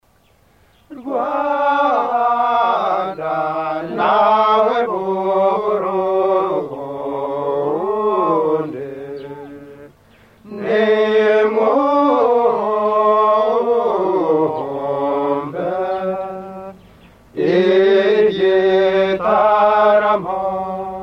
praise song, Burundi, Tutsi

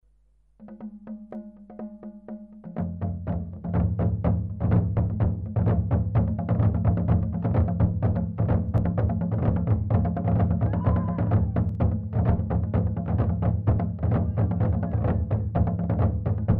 ingoma, rwanda

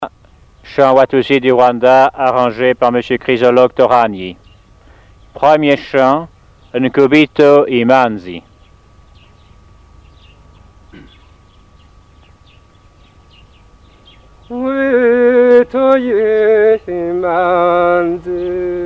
praise song, Burundi, Tutsi

